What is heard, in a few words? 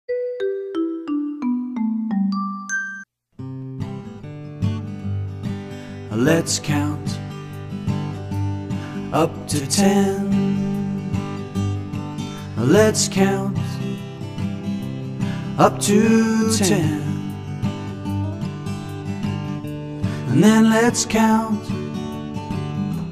Lets Count up to Ten Counting Song